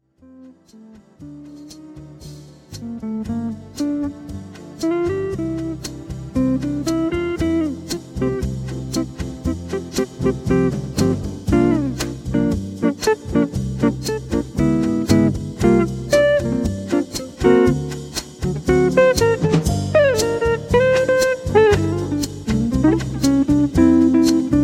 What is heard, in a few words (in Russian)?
Romanticheskaia Muzyka; Romanticheskii Uzhin. Muzyka Dlia Romanticheskogo Ve